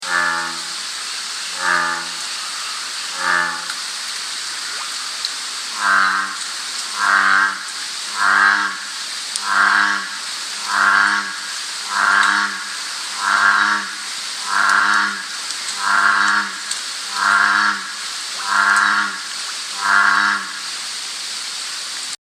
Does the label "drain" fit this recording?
No